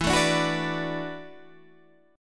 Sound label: E 9th Suspended 4th E down Guitar Open D